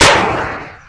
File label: rocket launch, weapons